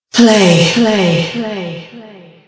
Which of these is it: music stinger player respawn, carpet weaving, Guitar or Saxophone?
music stinger player respawn